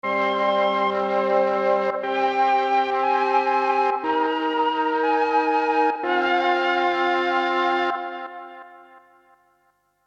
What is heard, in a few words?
RE-20 Rich Echo